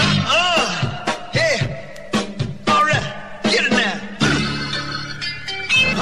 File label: wonky break, funky drum loop; Drum loops